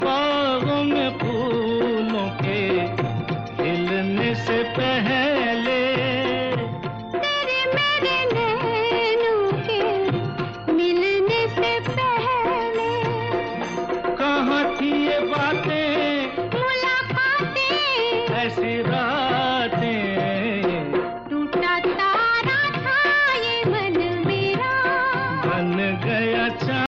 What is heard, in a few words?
old hindi ringtone, bollywood